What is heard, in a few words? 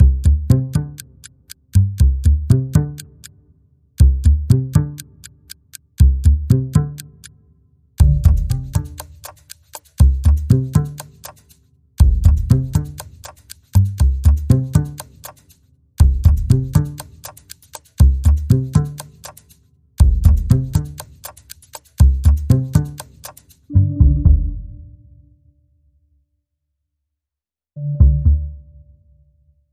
Underscore